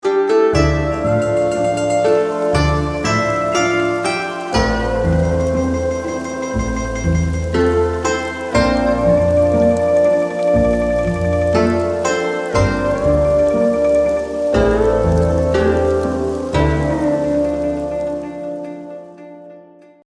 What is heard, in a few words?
bg music